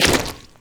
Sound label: fs fm paint, footsteps; Portal 2